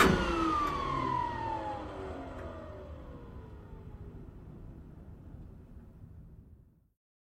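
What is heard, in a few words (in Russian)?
прекращающийся звук отработанного мотора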